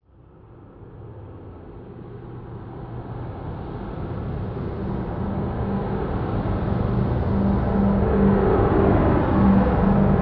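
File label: Ambient Vehicles; Red Orchestra Ostfront 41-45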